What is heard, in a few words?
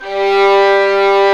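strings, 8pc; String Master Series; Vlns 2-5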